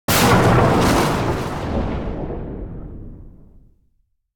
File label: Thunder Clap - Light Fast Crack; Hollywood Edge; sound designer's toolkit v2